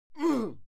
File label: human vocal male fight yell muted